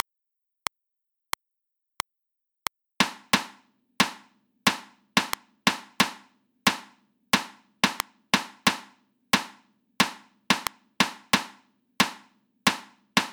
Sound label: reversed rhythms 16ths at 90 bpm